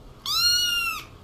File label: Kittens mew